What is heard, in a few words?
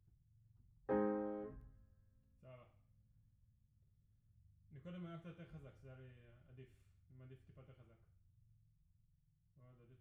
Piano Mono